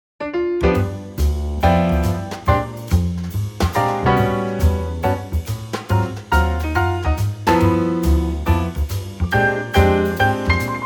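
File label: G Trio, backing track; key - G - vocal range - G to B; Punchy and swinging Trio arrangement of this jazz standard.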